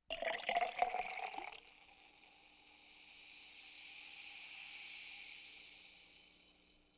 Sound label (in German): Bei Tisch Mineralwasser In Glas Gießen